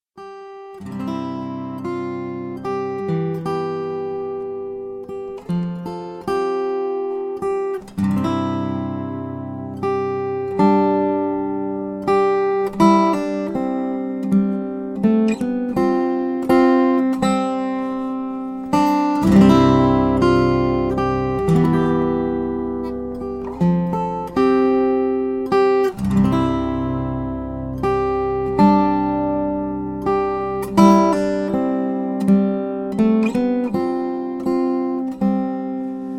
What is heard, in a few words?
Instrumental